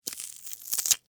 Tear Rip Green Pepper, Texture, Tear, Rip, Green, Pepper, Skin, Vegetable; Ripping; BlastWave